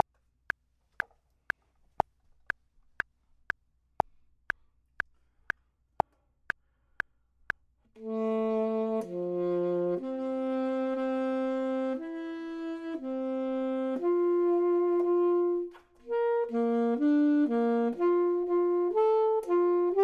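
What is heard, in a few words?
bottom, Saxophone and Clarinet; voxman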